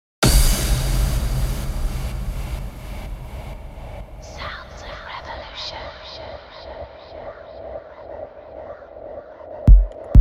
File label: Kicks; SOR Prime Kicks Vol.1